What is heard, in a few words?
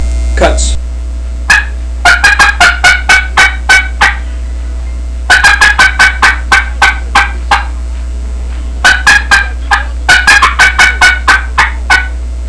cutts, turkey; wm penn; Southland